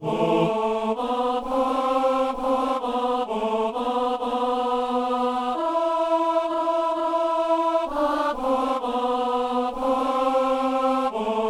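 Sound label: tenor; Kyrie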